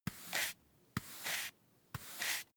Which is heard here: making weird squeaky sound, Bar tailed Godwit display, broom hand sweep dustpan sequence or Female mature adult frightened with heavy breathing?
broom hand sweep dustpan sequence